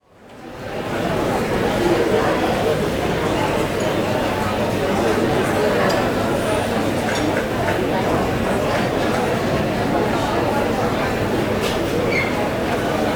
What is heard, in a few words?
Crowd Indoor Near Mall Food Court; Walla; Sound Ideas; Walla Series